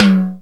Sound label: TOM, Korg DDD5 Drum Machines